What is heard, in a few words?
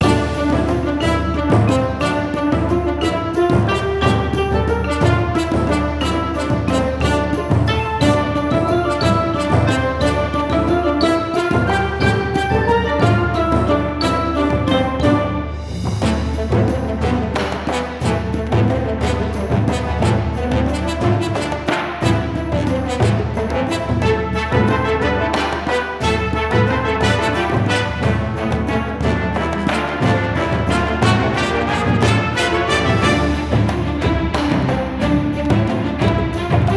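background music; Pirates of the Caribbean Dead Man's Chest; PlayStation Portable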